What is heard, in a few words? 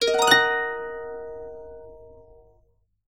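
harp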